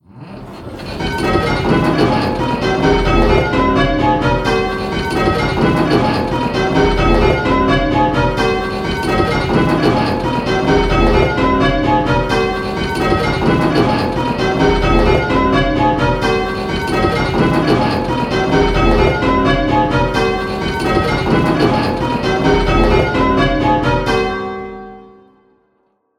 Striking 8-Bell Call Changes, bells

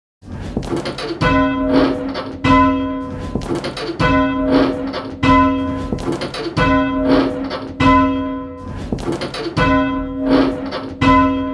bells, 6th; Just One Bell; Pebworth

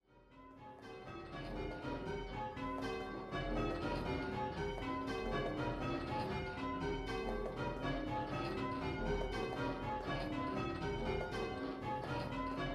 Grandsire Triples, bells; Pebworth

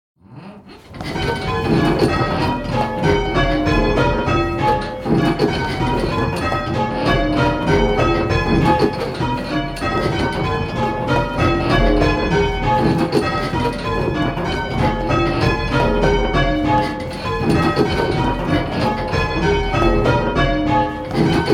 Penta, bells, Plain Bob Doubles

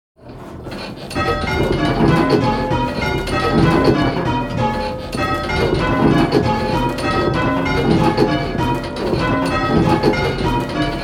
Dorian Kent, bells

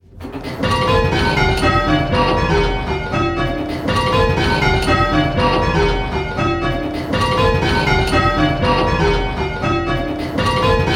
Striking 6-Bell Rounds; Pebworth

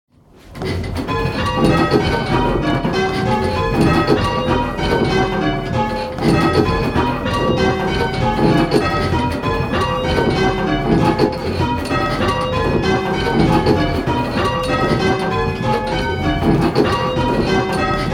Penta Little Bob Major, bells; Pentatonic

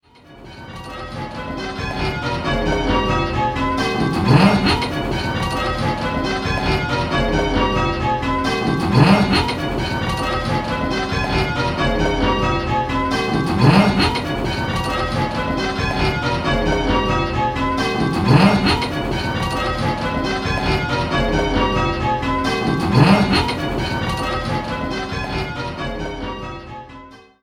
Call Change 10-Bell, Bells

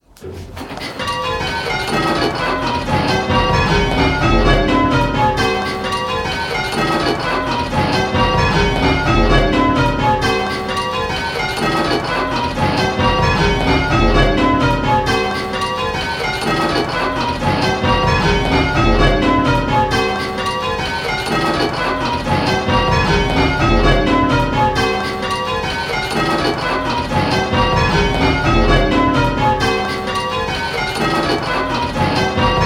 Rounds 10, bells; Listening and Striking; hearing 10 bells; Pebworth

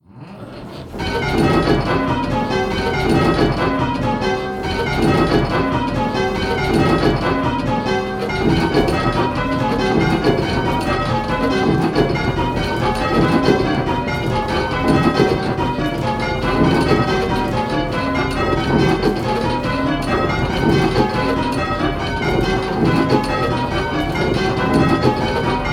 Ionian PB Triples, bells

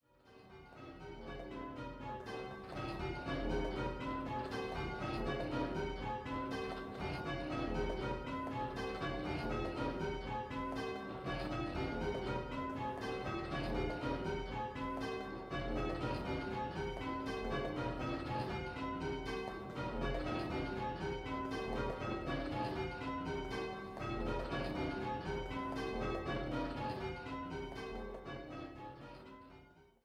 Stedman Triples, bells; Pebworth

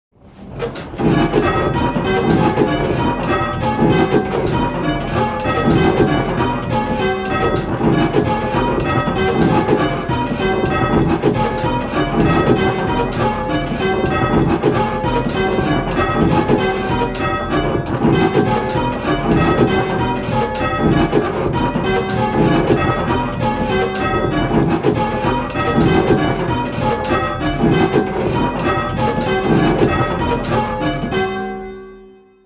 Penta Stedman, bells, Stedman Doubles

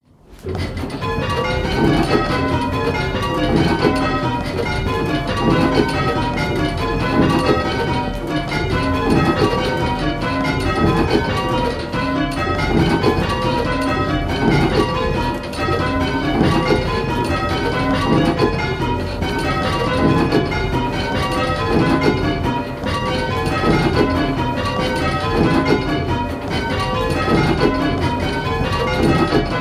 Phrygian PB Triples, bells, Plain Bob Triples; Pentatonic

